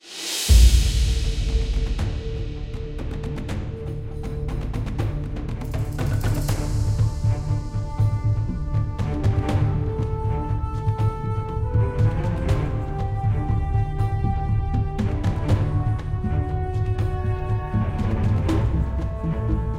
Timeline BGM; AFK Journey; Android

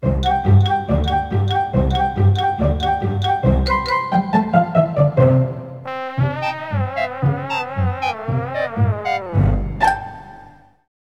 In Game Music; Animaniacs Ten Pin Alley; PS1

